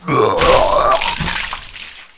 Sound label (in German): Kotz